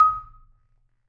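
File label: Marimba medium F D#, marimba; Sampleheads - Dave Samuels Marimba & Vibes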